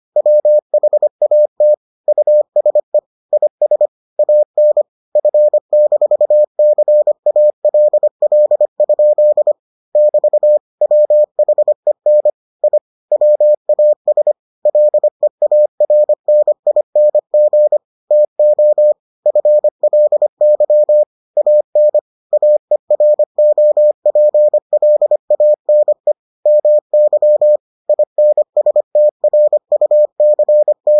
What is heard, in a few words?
morse